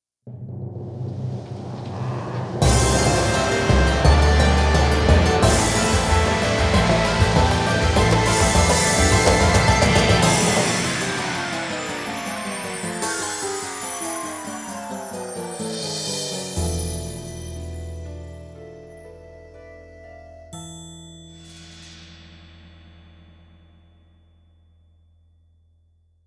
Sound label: instrumental